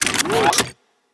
Weapon Reload

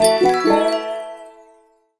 Purchase Upgrade; SpongeBob Krusty Cook-Off; android

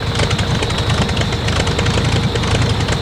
Flying Jaw Idle

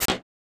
Hit

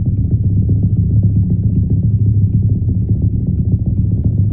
Plank Loop; Nicktoons Nitro; arcade

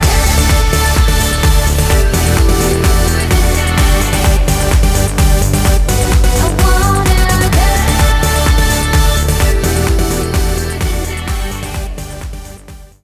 Stinger; DFH Stadium

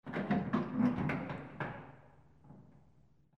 Hinged opening

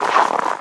snow wading; ice age scrats nutty adventure

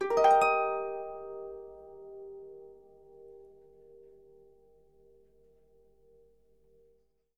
achievement unlocked; quell zen switch gamerip 2020

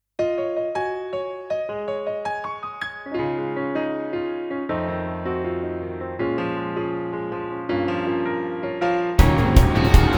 Off Vocal; Otome ga Musubu Tsukiyo no Kirameki; Sofmap original digital contents; OP ED short set

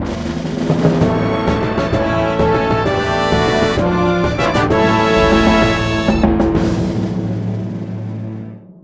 Positive (Fanfare); super mario strikers gamecube; Gazette

